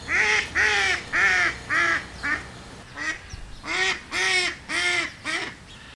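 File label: mallard female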